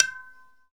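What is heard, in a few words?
percussion tabla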